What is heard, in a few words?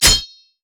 basic attack; Kennen